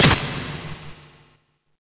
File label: break; mgs